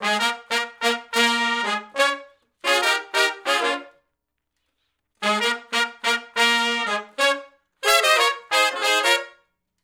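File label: R&B Riff (Bbmin) unison to harmony; Sections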